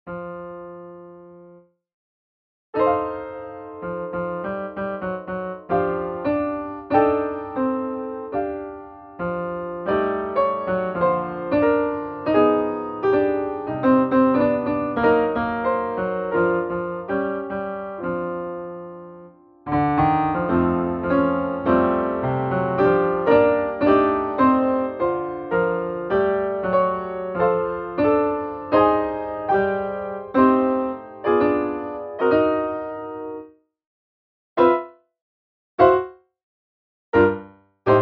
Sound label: Piano